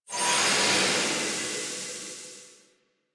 Portal Enter Stinger; Drifter

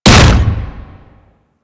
Hammer Impact Flesh A; Warframe; Weapons

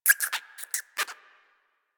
Rodent Vocal Captured Idle; Warframe; Hunting Critters

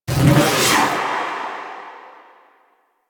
Doom Sword Cast; Warframe; Excalibur

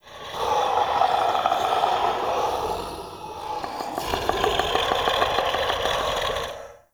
Male Vocal Idle; Characters; Enemy; Vocals; Mockeries

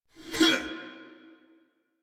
Attack Effort; Warframe; Dax Knight; en

